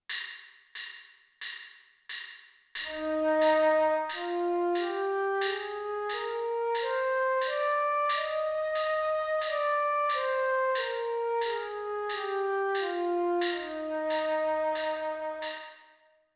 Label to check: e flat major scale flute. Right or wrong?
Right